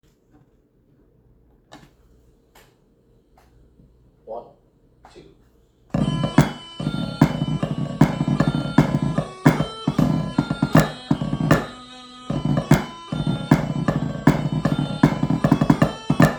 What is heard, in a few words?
Pad Canter, drummers; Sweeney's